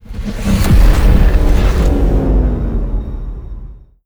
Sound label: sfx battleship moving out; Stellaris; nemesis